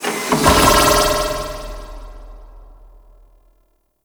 Operator Pod Target Open; Warframe; Liset; Hub; Lower Deck

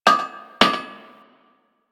Village Hammering One Off D; Warframe; Ambience; Eidolon Plains; Ostron Village

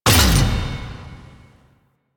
Challenge Complete; Veilbreaker; Kahl

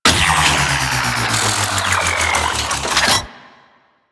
Scenario Beacon Close; Warframe; Railjack

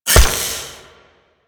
Rain Of Arrows Impact; Warframe; Duviri; Duviri Dax; Abilities; Dax Archer

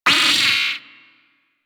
Vocal Pain; Warframe; Amalgam Moa

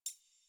Computer Room Beep Misc; Warframe; Ambience; Nineteen Ninety Nine

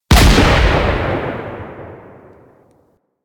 Four Barrel Rifle Shot

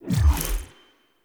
UI Default Theme Open; Warframe

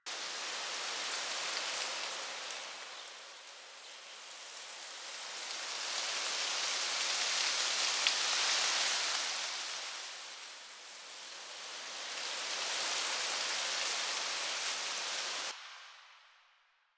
Summer Tree Leaves Loop, Ambience; Warframe; Nineteen Ninety Nine

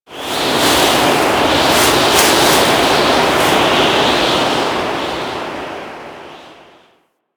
Ocean Rain Gust; Warframe; Grineer Ocean

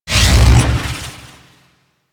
ninja teleport; Warframe; Warframes

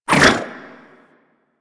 Long Melee Spin Whoosh; Warframe; Weapons

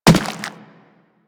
Infested Runner Step Default; Warframe

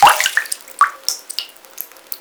Hut Interior Drinking Well Drips; Midrath Island

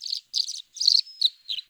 Forest Open Glade Bird Close, Day; Ambience

